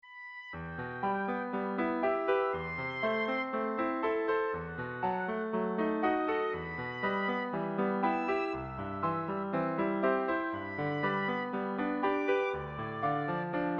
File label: brahms, oboe